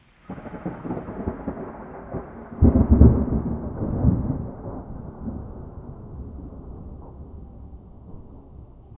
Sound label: sharp crack of thunder followed by distant rumbles